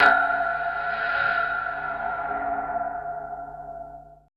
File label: AMBI FX